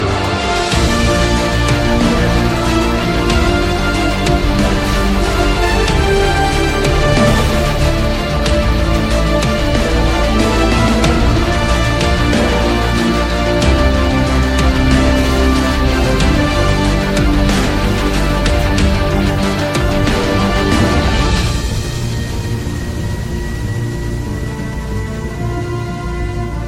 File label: Season Tone; PUBG